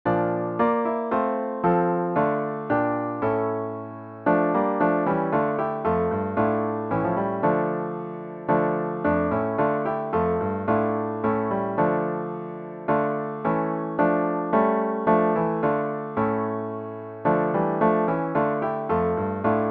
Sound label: piano with Intro; worship service